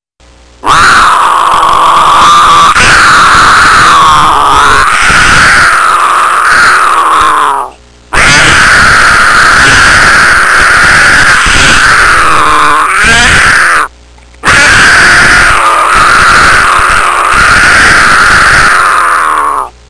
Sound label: Mountain Lion